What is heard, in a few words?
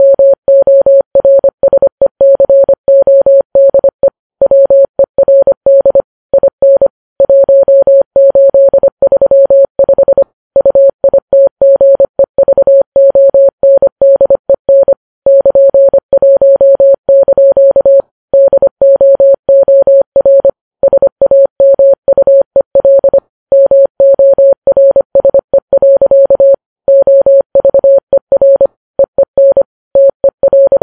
morse